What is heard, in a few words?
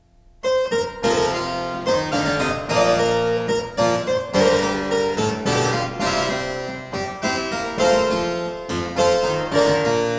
The galliarde (harpsichord); Byrd